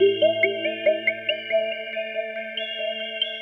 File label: SPACE SYNTH 140 A; SpaceSounds